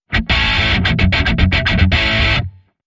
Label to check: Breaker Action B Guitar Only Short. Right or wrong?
Right